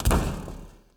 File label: roof land; Golden Crayon Sounds